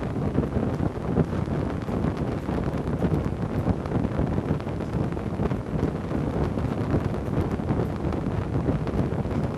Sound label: fire loop medium flame; colony; Titanfall 2